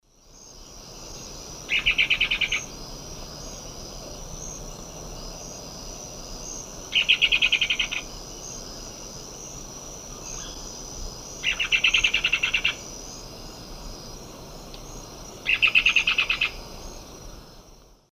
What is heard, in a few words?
Cercomacra serva, alarm call, Eastern Lowlands, S. America